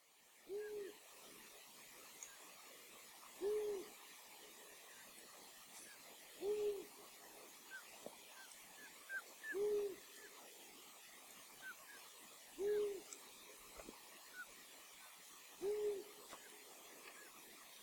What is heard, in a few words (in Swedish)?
hornuggla; fågelljud